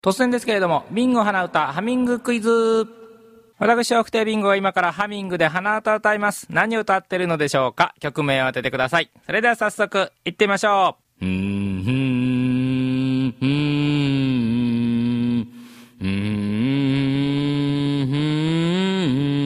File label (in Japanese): hanauta